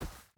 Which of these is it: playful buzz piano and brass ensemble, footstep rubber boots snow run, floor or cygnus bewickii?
footstep rubber boots snow run